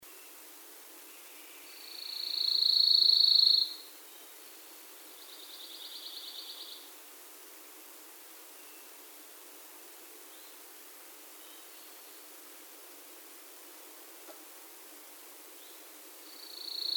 pine warbler setophaga pinus, PINE WARBLERS ON ABACO; Paul Driver